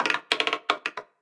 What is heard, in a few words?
ball Bounce, roulette